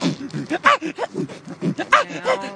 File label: 94 bpm ridiculous beat box vox; 091 to 100 bpm